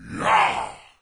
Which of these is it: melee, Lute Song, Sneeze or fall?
melee